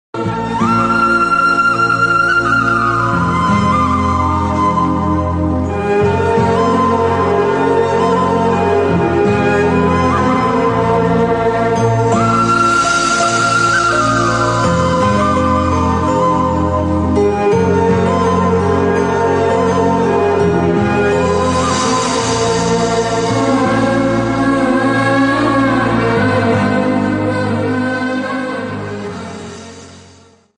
Romantic Bgm Telugu Ringtone

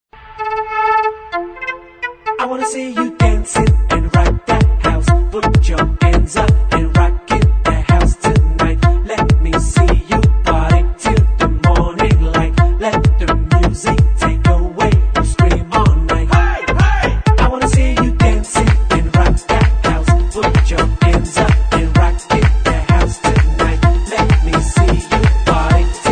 House Techno Ringtone

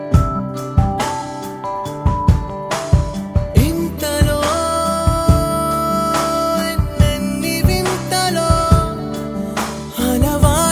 Male Version Telugu Ringtone

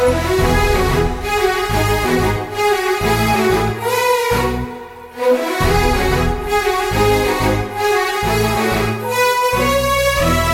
BG Score Telugu Ringtone

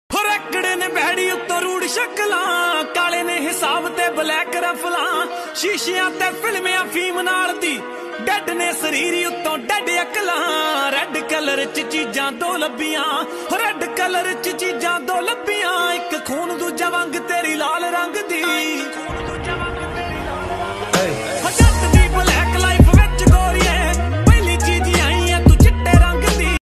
Punjabi Beats Ringtone

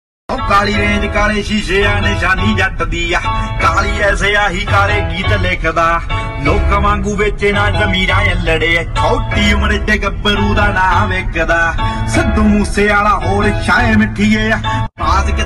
Punjabi Tune Ringtone

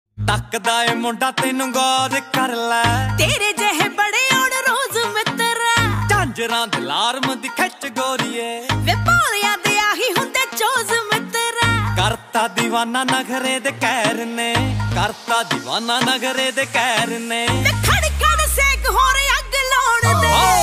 Dance Punjabi Ringtone

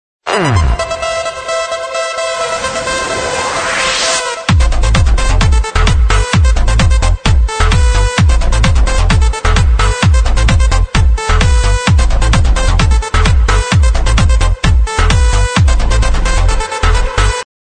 Techno Rhythm

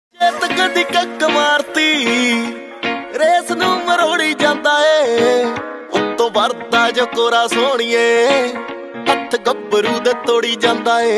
Hood Punjabi Ringtone

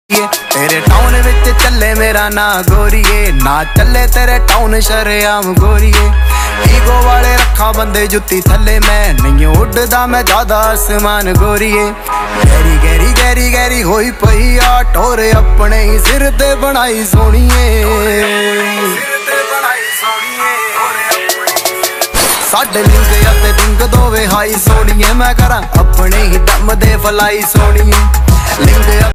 Hood Punjabi Ringtone

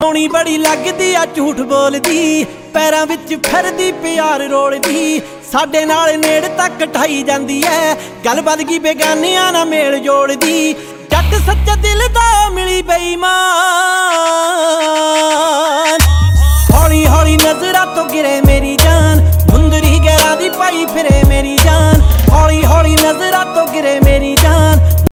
Punjabi Beats Ringtone

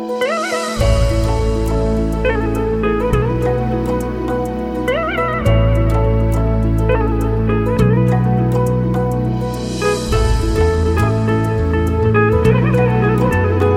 Instrumental Tone, Telugu Ringtone

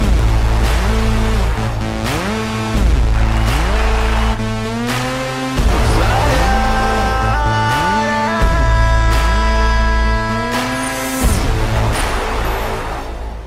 BG Tone Telugu Ringtone; Raja The Great

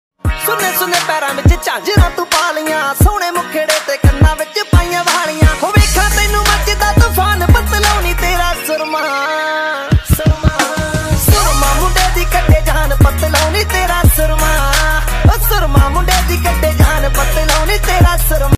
Punjabi Ringtone

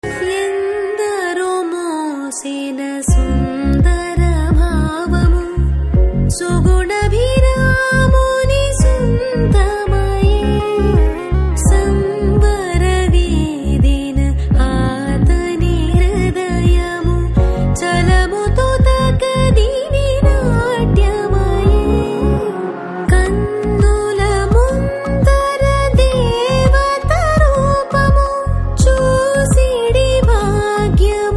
Telugu Ringtone; Chavu Kaburu Challaga

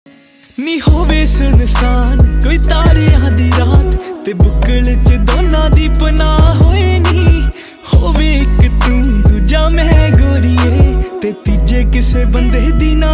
Jatt Punjabi Ringtone

